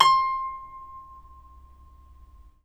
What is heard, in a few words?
CEL HARP C5; USB Soundscan vol.23; Historical Instruments; AKAI; CEL.HARP1